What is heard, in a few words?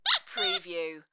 Funny Rubber Toy